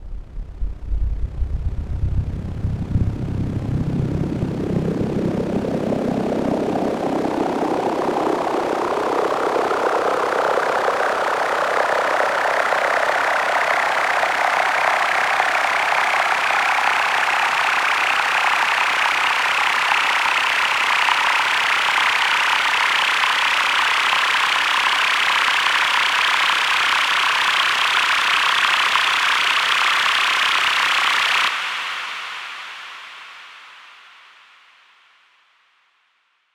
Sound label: 122 Sweep Fx